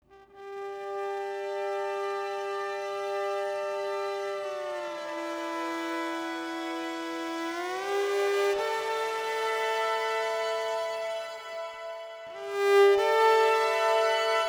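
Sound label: electric violin improv tune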